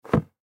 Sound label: Basketball Down Set Down Wood Surface; Basketball Sound Effects; Blastwave